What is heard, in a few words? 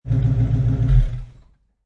Mustang 68 Fastback Shut Off Exhaust Perspective; Car Sound Effects